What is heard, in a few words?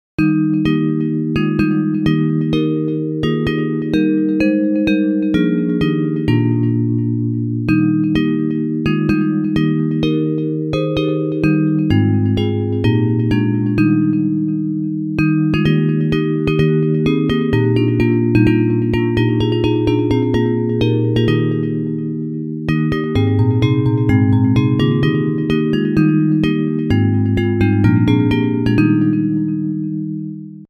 Bells